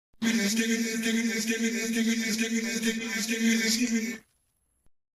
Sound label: toilet; satchel